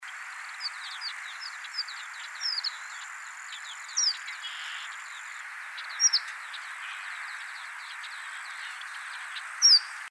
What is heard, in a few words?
Horned Lark; Xeno-Canto